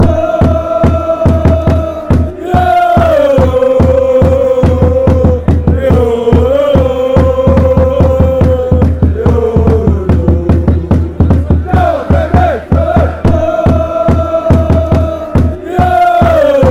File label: lololo kv mechelin